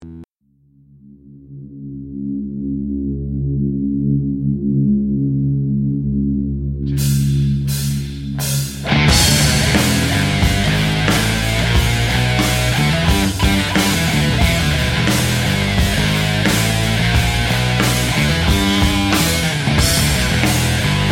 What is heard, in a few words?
soul